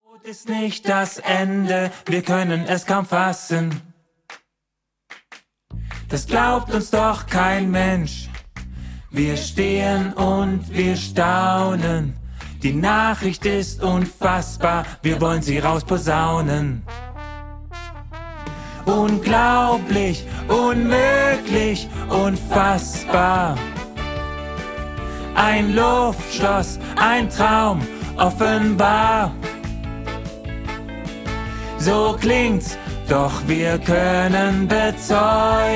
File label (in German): Lern-Bass